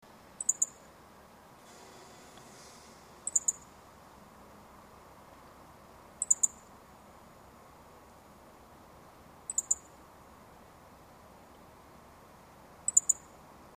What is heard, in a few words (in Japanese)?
hojiro